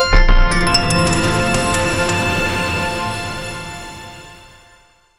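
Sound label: medieval win sound intense; gold coins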